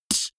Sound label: beatbox snare